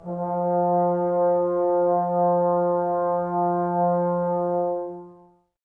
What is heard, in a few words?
BRS TBS F, Woodwind Brass Ensemble, Brass; Miroslav Vitous - Woodwind Brass Ensemble